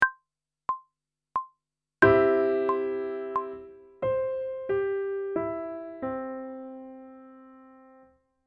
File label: Major Arpeggio one octave descending, pop vocals; Grade 1; Technical Work